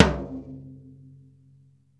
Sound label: DEEP DRUM